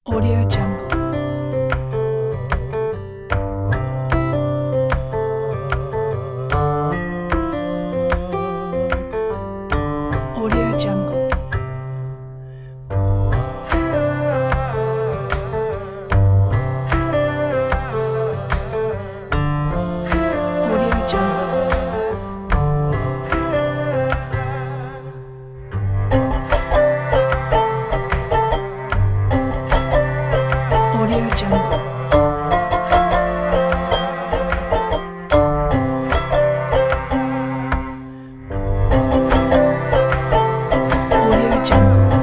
indie folk acoustic

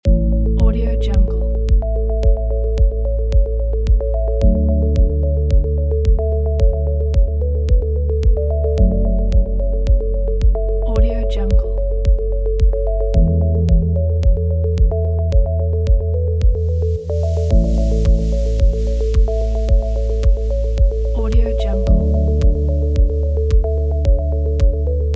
corporate ambient inspiration

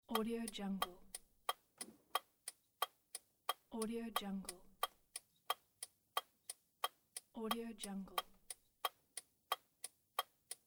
car turn signal loop

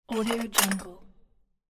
metal tool

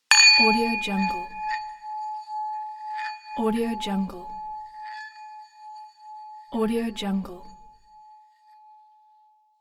singing bowl hit

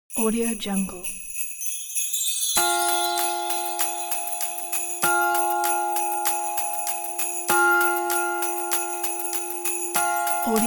christmas bells

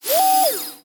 servo whirl

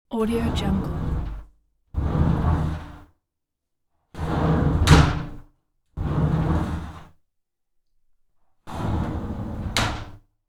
shower sliding door opening

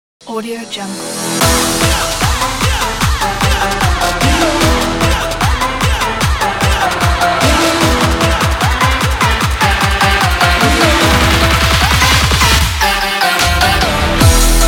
trap

